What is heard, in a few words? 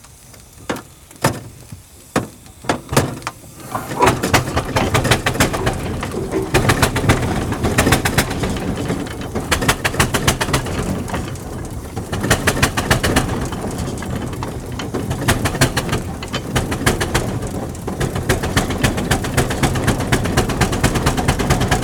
Traction Engine Startup And Moving